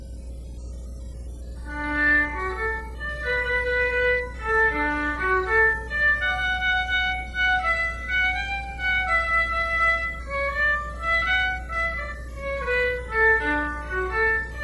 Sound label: Schottis